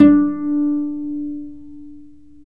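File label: strings violoncello pizzicato, Chamber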